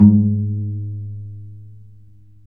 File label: STR VC PIZ, Chamber Pizz, String Master Series; Roland - String Master Series